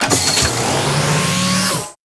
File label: Charge; Warframe; Grineer; Ogris